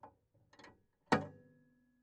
PayPhone

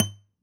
Classical Guitar Plink Chunks; Classical Guitar Extended Technique Stereo

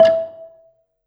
Gambang Soft Beater, Gamelan

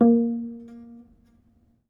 Harm Mid Soft, Piano Harmonics

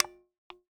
Taxi Meter

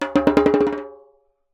Jembe Bounce; Percussion Samples

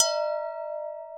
Strike, Vase

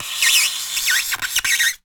Polystyrene Squeak

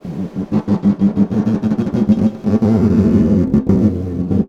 Snare Drum; Percussion Samples

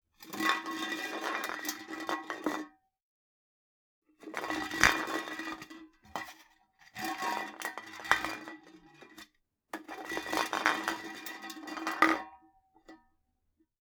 Can Crush And Roll, Drinks Can

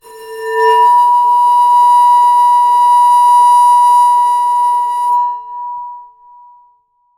Bowed, Large Wine Glass

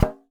Polystyrene Knock

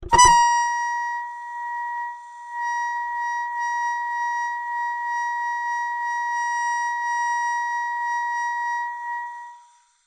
Ornament, Melodica

